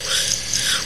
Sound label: INHALE MACHINES; Rarefaction; Poke in The Ear With A Sharp Stick